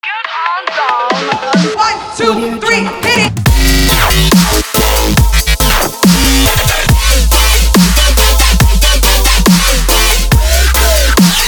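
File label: into the dubstep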